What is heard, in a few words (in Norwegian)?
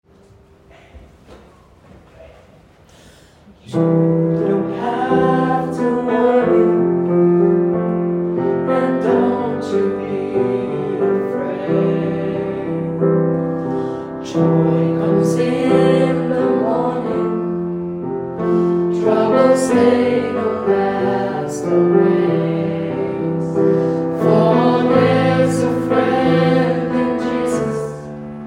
alle stemmer, gospel; kongsberg; kor; gospel for alle